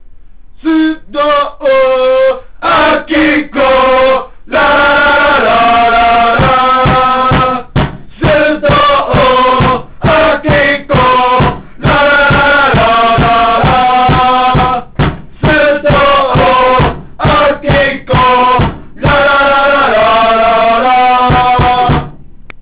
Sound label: song